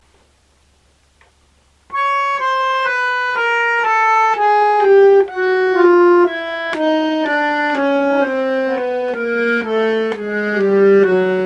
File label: basso pushing, akkordeon